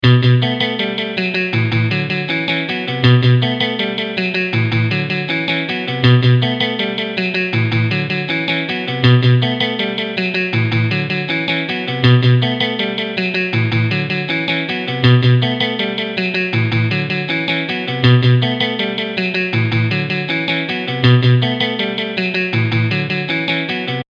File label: Helicopter intro; PhilippR